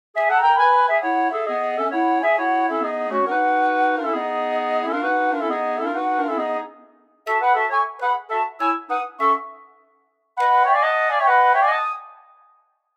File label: 3 CLARINETS